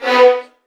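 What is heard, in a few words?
VN FX B1 right; ILIO; Kirk Hunter Virtuoso Strings VOL-1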